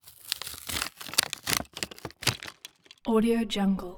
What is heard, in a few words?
plastic package open